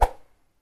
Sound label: whoosh